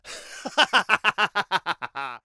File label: Laugh; TR3; Tony